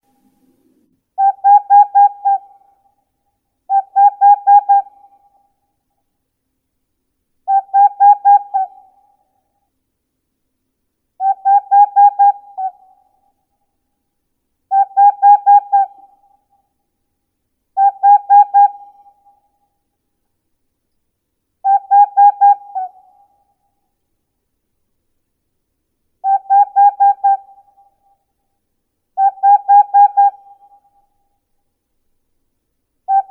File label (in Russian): sich mohnonogii aegolius funereus